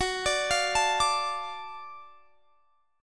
Gb Minor Major 7th Sharp 5th Gb down; arpeggiated

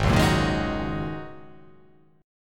G sharp 9th Suspended 4th G sharp

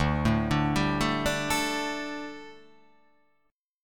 D Suspended 4th D

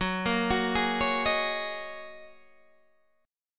Gb 6th Add 9th, Guitar, DADGAD

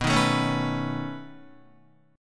B 9th B down Guitar; strummed

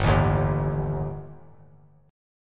G Suspended 2nd Sharp 5th

